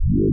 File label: portal short